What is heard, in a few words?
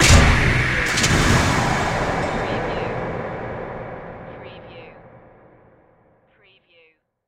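Production Element Hit Haunting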